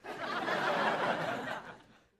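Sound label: Crowd 100 Short Light Medium, medium interior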